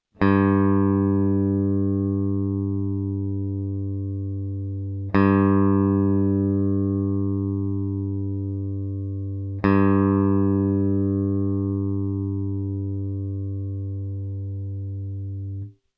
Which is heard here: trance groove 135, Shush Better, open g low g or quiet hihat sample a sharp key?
open g low g